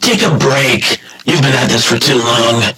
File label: Game Over